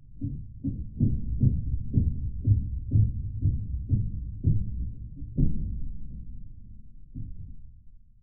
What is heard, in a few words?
Ambient